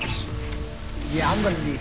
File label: helps